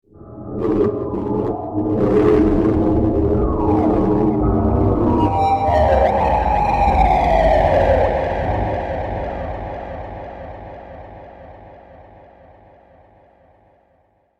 Poltergeist, ghost; horror sound effect

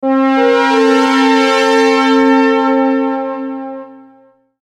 Logo Horn Light Phase; Sonic Logo Sound Effects; Production Element Imaging Element Accent Transition

